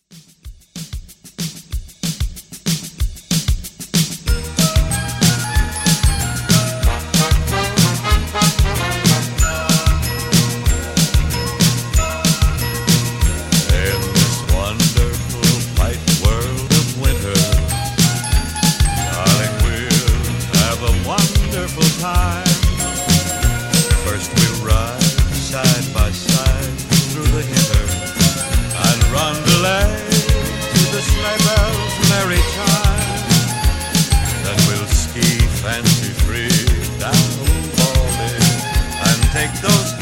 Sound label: Christmas Re Drum 188; remixplanet